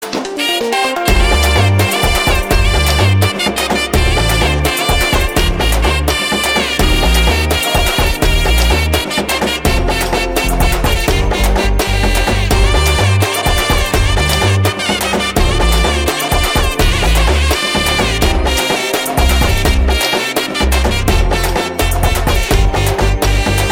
Love BGM

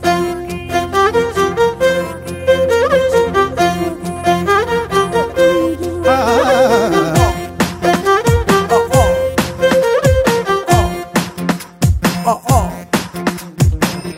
Piano Tone

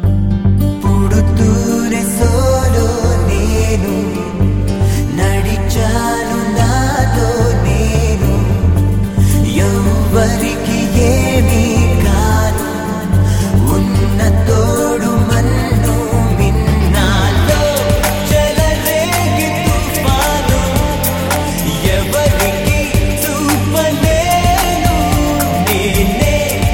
Solo Bit

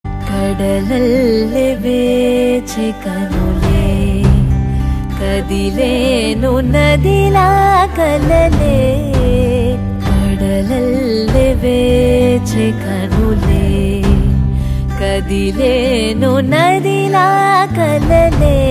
Female Ringtone

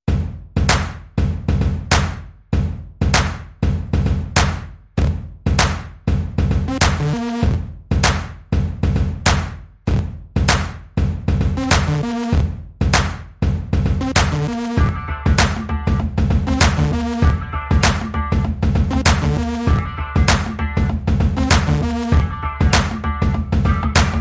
Theme Ringtone

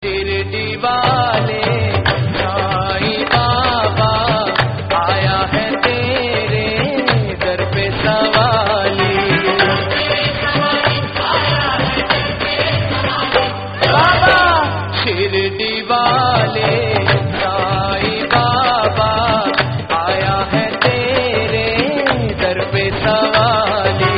Ringtone Male Version

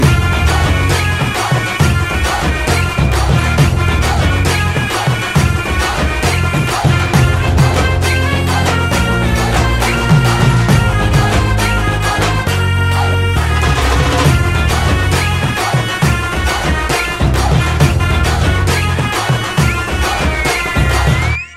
Drum Beats

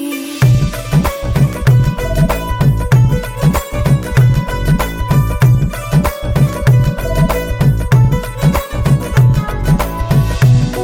Instrumental Tone

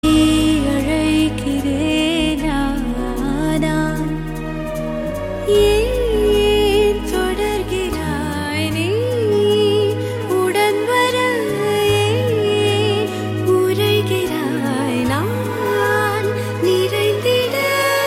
Female

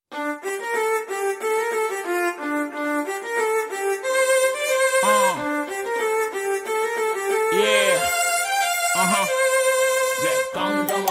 Violin Tone; Pilla Nuvvu Leni Jeevitham

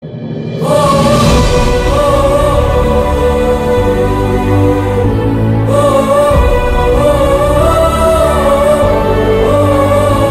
trailer bgm; Aravinda sametha

